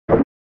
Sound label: SNES Fighting Swing